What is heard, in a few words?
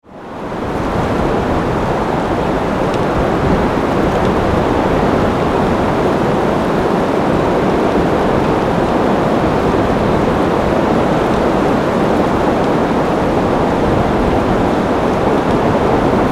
Wind, Storm, Forest, Trees, Branches